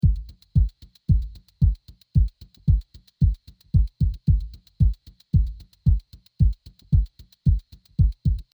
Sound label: kick loop